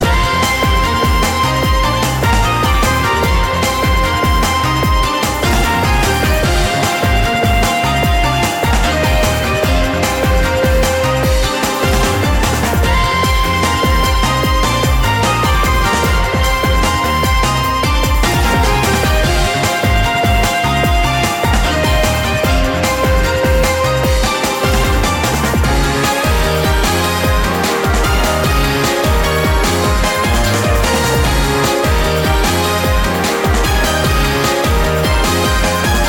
bgm battle; Sengoku Otome Legend Battle; PS Vita

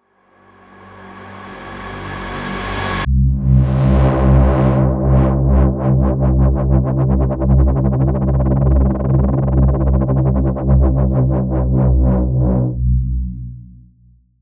boss4 intro transform stinger 120bpm

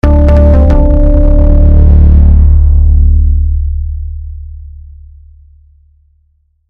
Victory Theme; Red Death; PS4, PS5, Switch, Windows, Xbox One

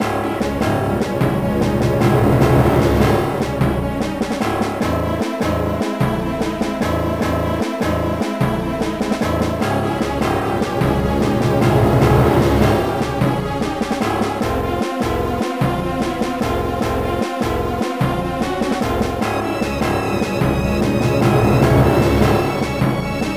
Action; Aliens Infestation

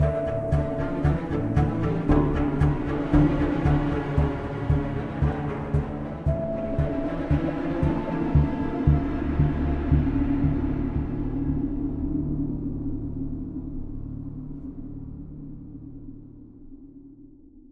String; assassin s creed unity

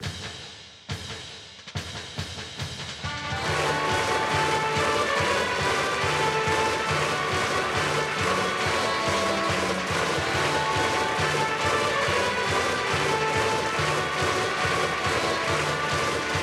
Ouen Brassband; ebaseball powerful pro yakyuu 2020 ps4 switch gamerip 2020

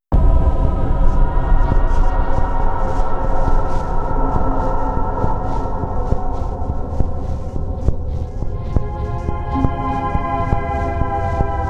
Side Stinger; Origins

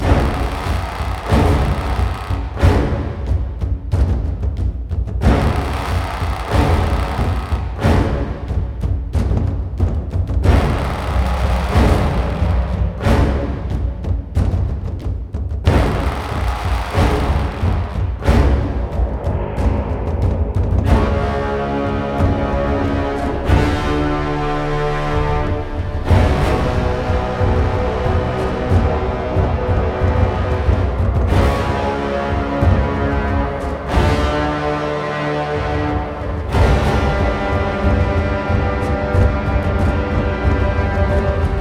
Bossfight Prelude Frantic; Glott; Ryse Son of Rome

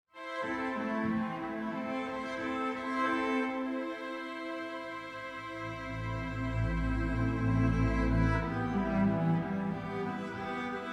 Explore Day Acoustic

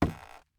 wood land

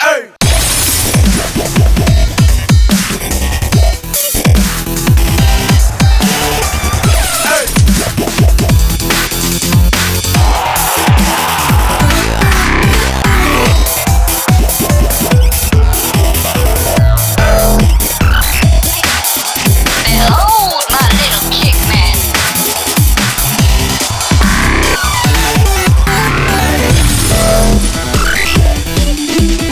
Theme Loop; Behold the Kickmen

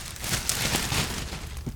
Trash Empty

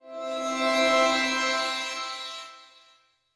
Music Shared jingles - Enter area; Smart As...; PS Vita

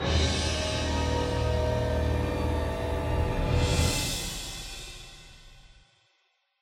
Fail; Captured And Escaped; tintin reporter cigars of the pharaoh

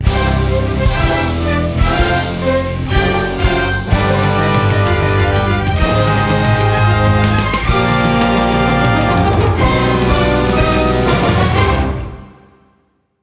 Major Win Full Fanfare

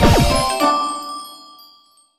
Jingle So-So Dish; StreetPass Mii Plaza; Feed Mii; 3DS

